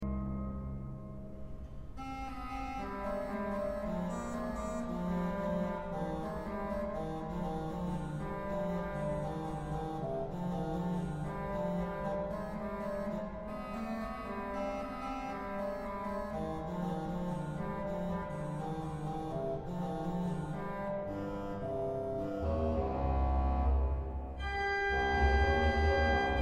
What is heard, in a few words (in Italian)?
Basso Continuo